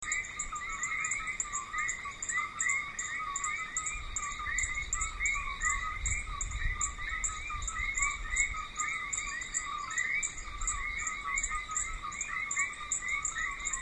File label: eleutherodactylus gryllus el yunque national forest puerto rico; A chorus has a certain telegraphic quality, and is very characteristic of some Puerto Rican; West Indian